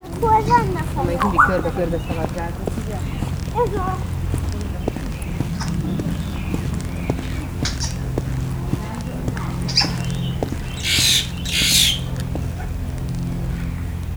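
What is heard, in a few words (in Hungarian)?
körbeszaladgál jákó szürke papagáj, állathangok; szegedi zoo 2011; gyermeknap gyermekszaj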